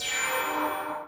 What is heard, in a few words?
MOVE; C64 Dreams; Multi-Sound Default